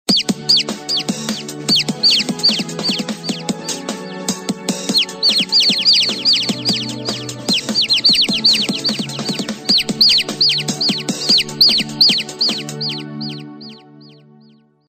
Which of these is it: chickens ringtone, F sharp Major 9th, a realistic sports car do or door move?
chickens ringtone